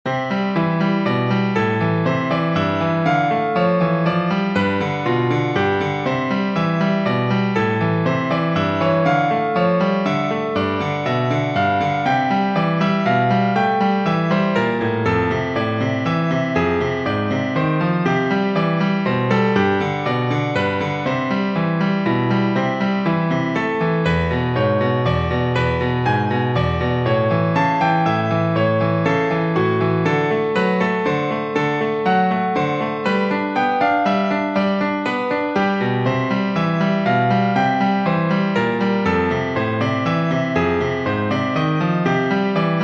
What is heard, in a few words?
rhythm changes prelude